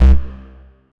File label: light bass with reverb